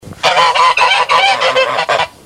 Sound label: goose mono